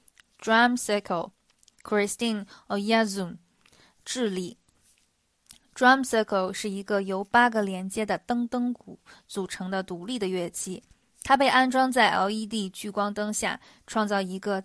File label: drumCircle; cmn